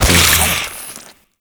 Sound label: Modular Secondary Barrel Loop End; SU Modular Guns; Barrels